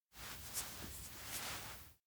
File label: dog leg massage; anemoi